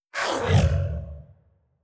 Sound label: Traphideous VO